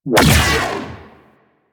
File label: Mega Beam Fire; Warframe; Venus; Venus Flying Spaceman